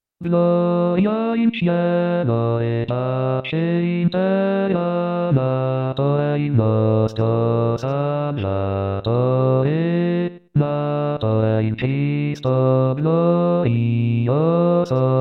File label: Gloria In Cielo Bassi; natale